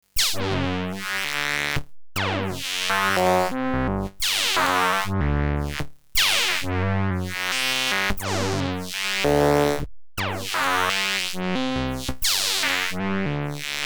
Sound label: semto morphing